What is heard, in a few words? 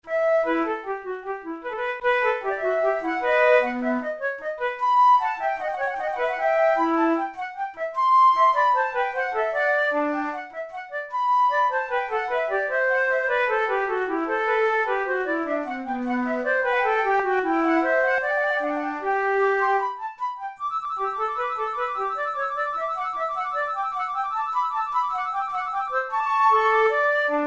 in e minor, flute